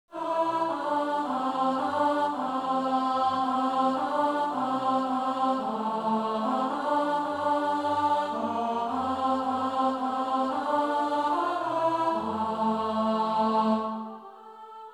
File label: Alto